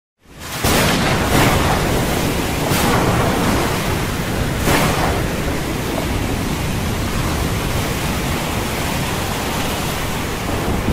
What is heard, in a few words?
sea and Rain